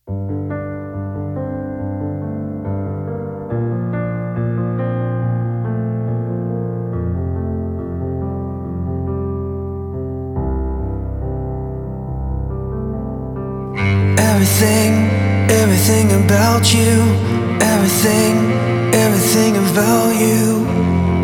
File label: Acoustic